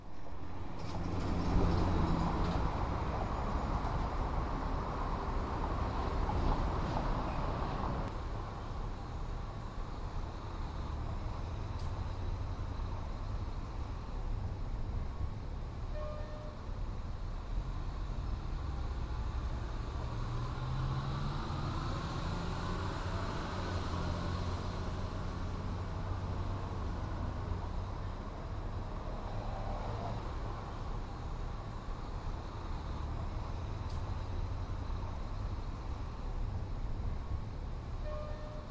Outdoors grocery parking lot

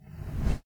Backwards Whoosh

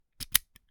Lock Close Lock

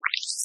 Industrial

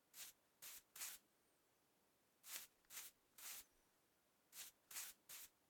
Sweeping

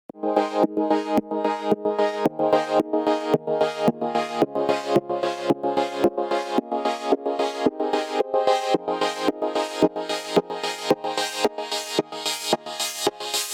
Dubstep Loop

